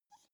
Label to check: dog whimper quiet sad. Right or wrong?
Right